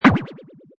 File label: Cartoon Warble, Wobbly impact.; Comedy/Cartoon; Funny Sounds; Lynne Music